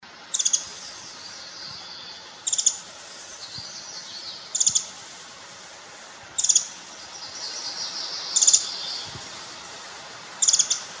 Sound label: Scold, Wrens Kinglets Gnatcatchers; WIWR; Coons